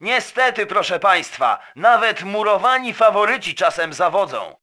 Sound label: fail; wesole przedszkole bolka i lolka; olimpiada letnia